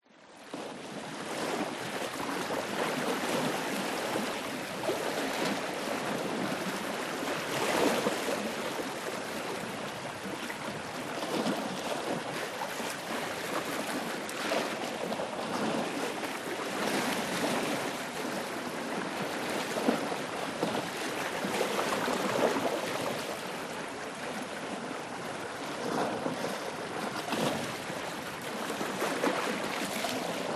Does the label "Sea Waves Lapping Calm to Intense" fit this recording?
Yes